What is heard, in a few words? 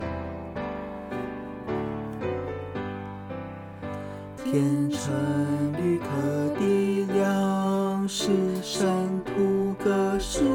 vocal; Hymns; Chinese